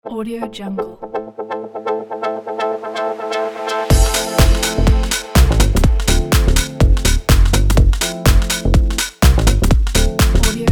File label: chill out lounge